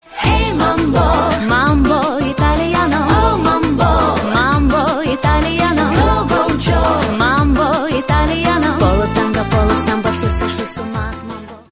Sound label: italian mambo tone